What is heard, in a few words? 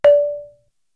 prc d4, Gamelan, KORG DSS-1; ProSamples vol.55 - Retro Sampler